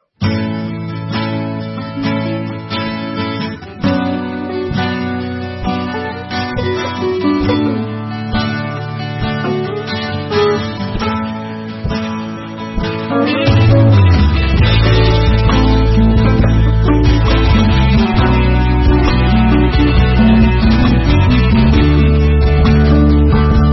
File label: RnB Latin